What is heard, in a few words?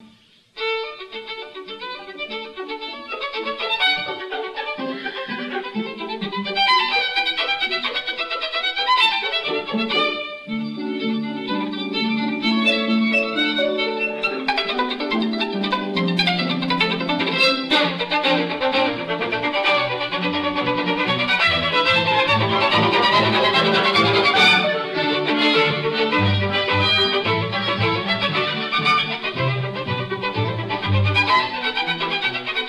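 Tzigane, Violin, LIVE RECORDINGS; RAVEL; Cembal d'amour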